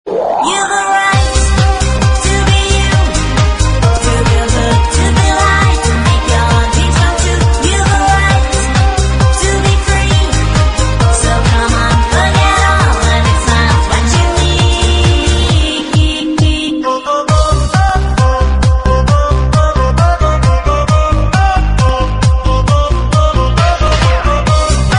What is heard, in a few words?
dance